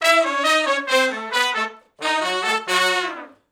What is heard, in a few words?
R&B Riff (F#) unison, Horncraft; Sony Creative Software; Crimson Blue and Fabulous Horncraft 4 RnB; Horncraft for R&B; Sections